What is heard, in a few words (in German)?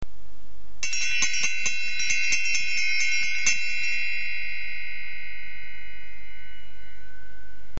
elefantenkl